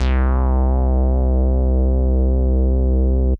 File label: Synth Prophet Bass C; Electronisounds